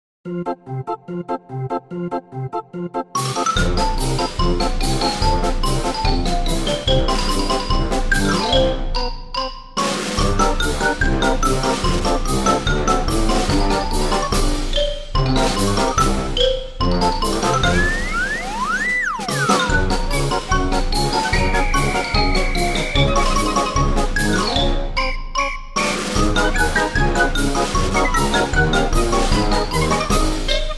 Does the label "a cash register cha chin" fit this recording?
No